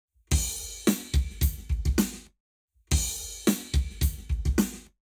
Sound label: Drums, 0to360deg; Lab Wet